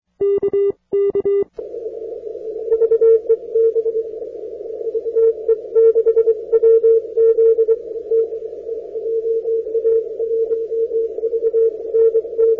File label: 160m cw